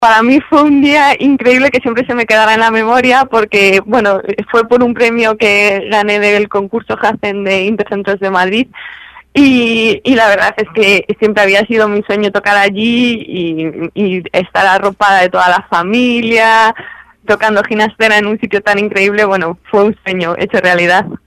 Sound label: concert piano